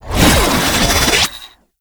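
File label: Stab Cloak Enter; Enemies; Abilities; Warframe; Tau; Dax Ninja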